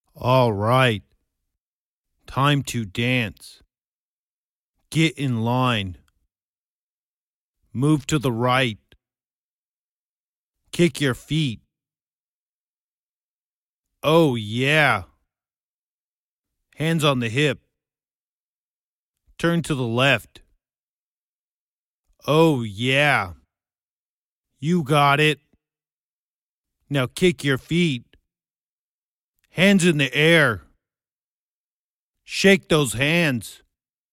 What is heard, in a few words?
dance, 120, Em, acapella